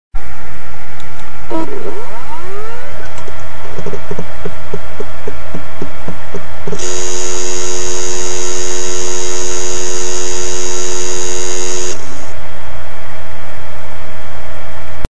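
seagate momentus nasty drilling, Hard Disk danneggiato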